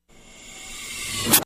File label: Mechanical Door, pneumatic